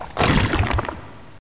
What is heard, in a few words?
spawn egg